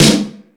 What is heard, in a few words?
All Snares, E-MU Pro-Cussion